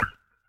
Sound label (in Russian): zvuk vibora kategorii